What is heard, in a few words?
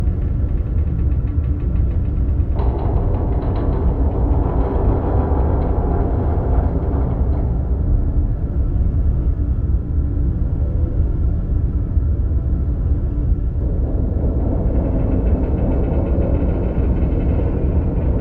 ambience; brainiac attack; Lego Batman 3 Beyond Gotham